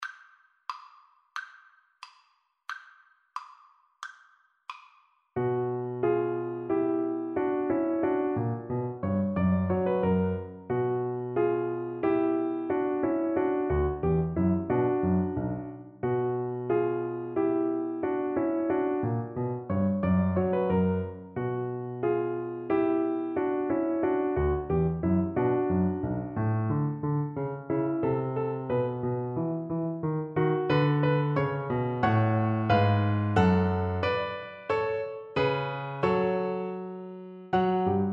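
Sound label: viola karaoke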